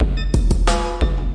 178 echo beepin kik n snare, drum loops and breaks; 161 to 180 bpm